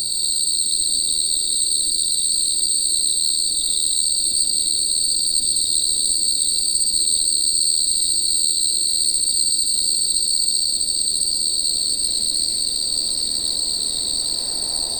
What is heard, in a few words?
add subtle butterfly flut